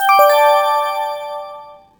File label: evidence collect